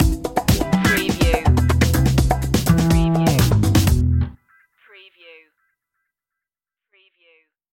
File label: funk stinger